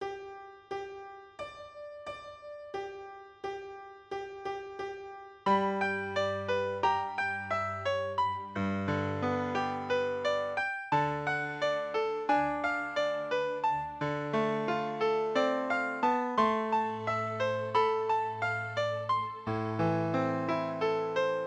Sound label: Goldfish Open Strings; Open String Tunes 19-23